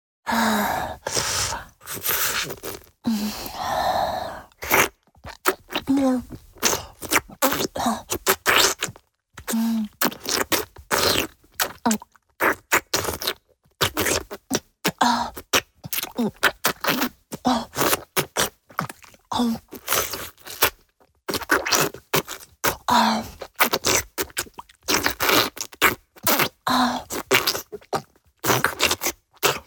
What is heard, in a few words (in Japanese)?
チュパ音, 高音強め; フェラチオ(中):頭を上下に振って陰茎をしゃぶる; チュパ音抜粋集